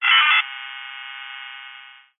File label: technology cb radio interference burst receiver; zapsplat